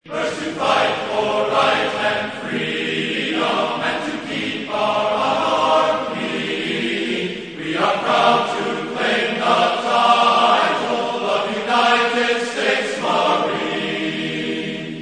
anchors aweigh vocal, us navy

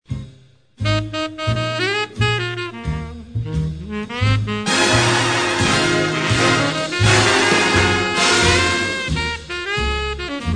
big band classics

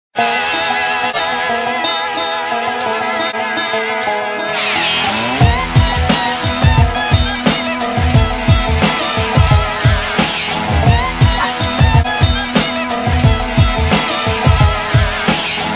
instrumental; My Name Is Earl

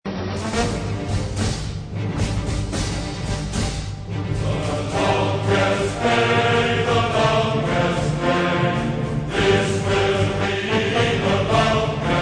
Chorale Version of March & Chorale; Longest Day

